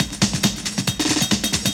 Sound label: Dance Grooves